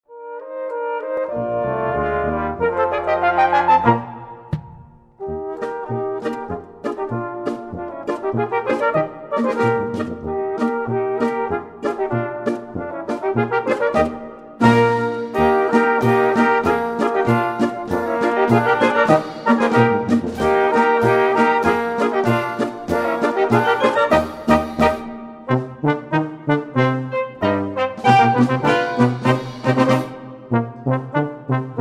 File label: Polka